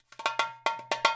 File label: FILL 1 L, Percussion; InVision Interactive; GRUNT